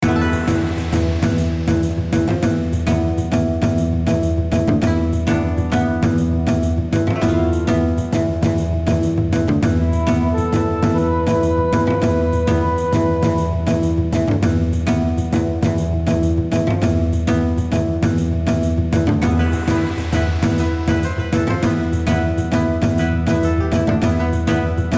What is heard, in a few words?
bg battle; bg battle 1